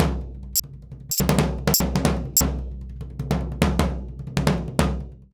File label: SURDO, Modern Percussion Loops